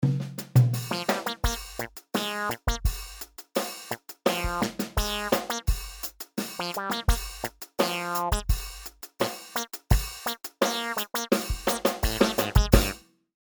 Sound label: Bass Wah; Ultra Analog Session